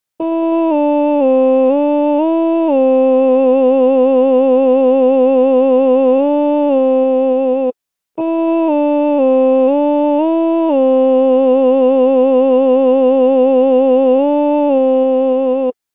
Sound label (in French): Tenor; Les Anges Dans Nos Campagnes